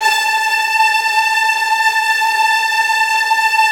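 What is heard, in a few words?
STR TREMOLO, Virtuoso Strings, A3, left; ILIO; Kirk Hunter Virtuoso Strings VOL-3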